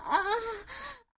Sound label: Moan